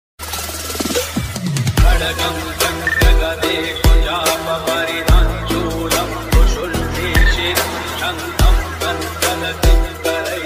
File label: ringtone instrumental